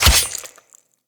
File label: Melee Sword Impact Flesh